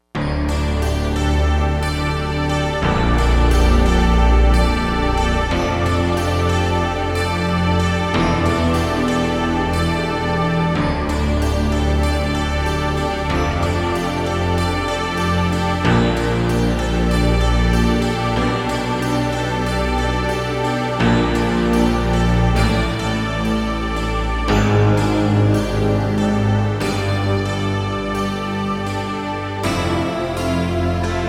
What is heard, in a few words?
Pluck Mix, synth